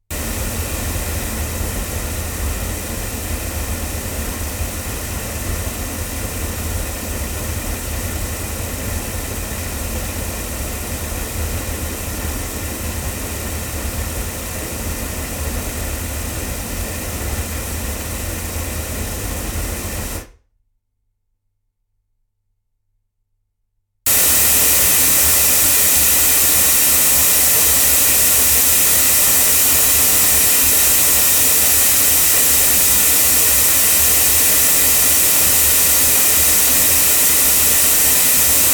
ADK A51TL omni loudspeakers, microphones recordings